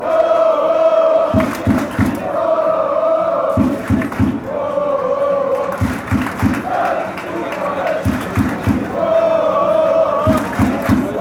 woah woah woah, fan chants; fc thun ale

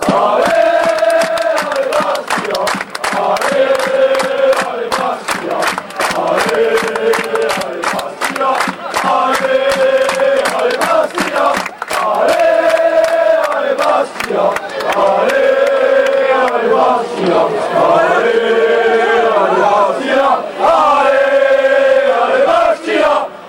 alle alle, fan chants; bastia

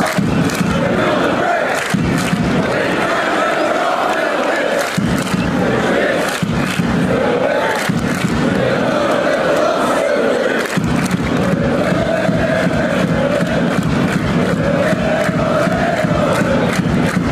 were melbourne born, fan chants

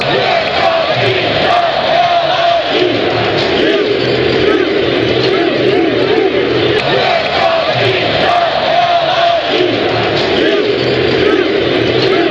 were gonna beat hell out you, fan chants

